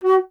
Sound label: Alto Flute